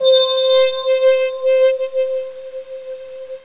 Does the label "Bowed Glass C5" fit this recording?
Yes